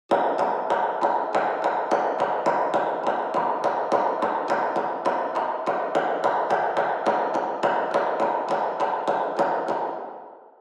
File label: Footsteps